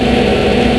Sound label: drone loop, ships engine; Quantum Redshift; jaya